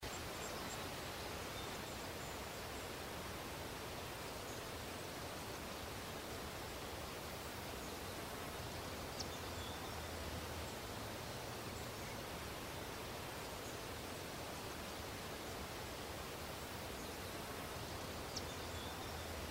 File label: Wind Trees Light